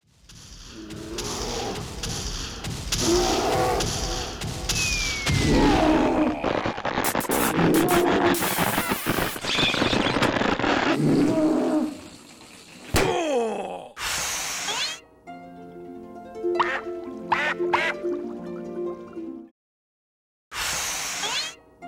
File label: bubble blow